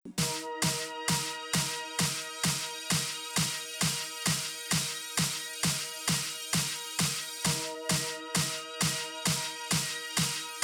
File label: aerobic dance 432 hz; 132 BPM